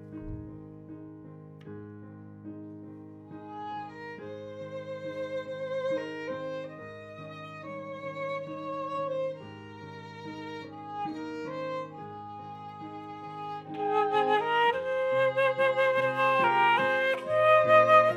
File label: Flute